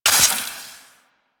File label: Quill Dart Gun Poison Mech; Warframe